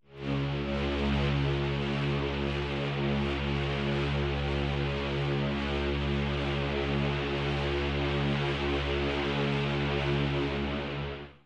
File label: boats, Synths